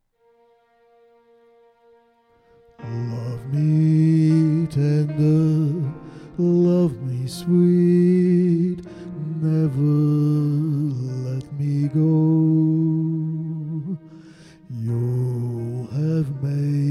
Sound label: Gesang